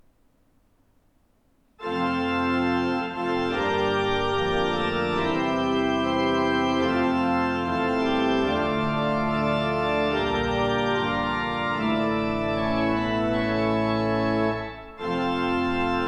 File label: Fanfare; Hammerfest kirke